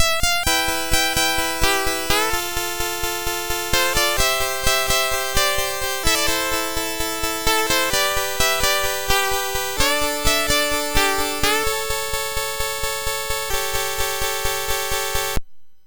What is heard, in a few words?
Pluck, MOS6581R4